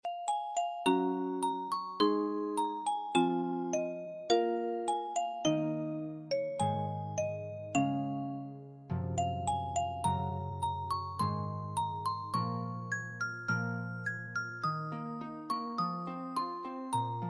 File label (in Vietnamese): piano không lời